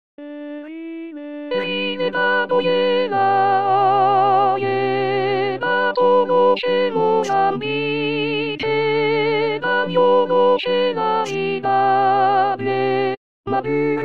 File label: Tutti; Anonyme; Traditionnel